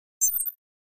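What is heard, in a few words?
Flash Beep Electronic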